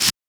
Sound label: Plastic Bag perc, percussion; Museum District; Houston